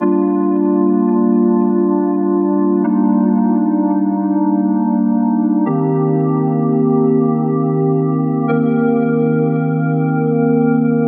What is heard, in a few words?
Keys 85BPM Cmin Acoustic RNB